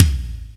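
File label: bass drum, left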